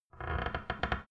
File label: creaking floorboard